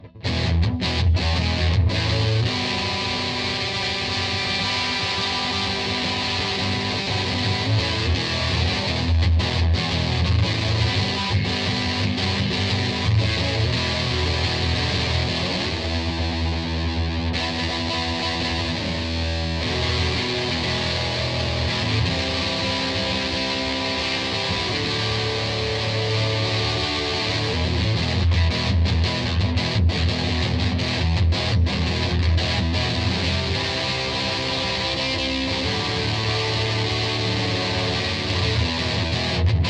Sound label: electric; MegaEpix